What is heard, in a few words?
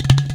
Udu break, Udu Claypot; Ethnic